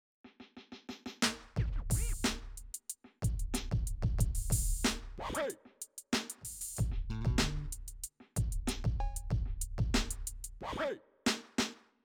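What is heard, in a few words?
Hip Hop, drums; Simmons SD1200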